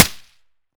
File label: fire sup, m16; Urban-Coalition; Decay; arccw ud